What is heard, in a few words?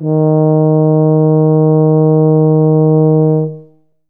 Tuba D#2; Best Service ProSamples vol.51; Classic Orchestra 2